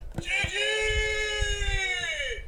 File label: button